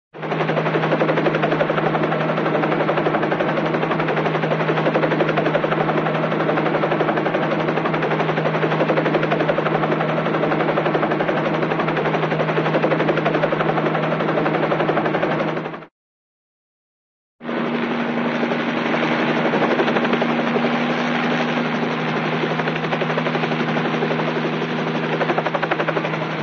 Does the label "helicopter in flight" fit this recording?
Yes